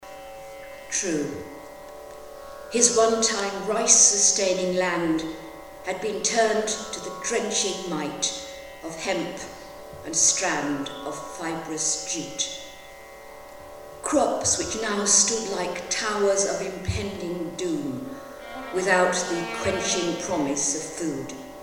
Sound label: Scottish Raga